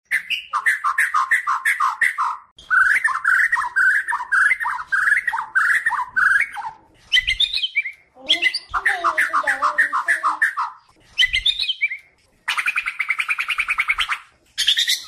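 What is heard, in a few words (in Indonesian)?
suara murai batu kepala putih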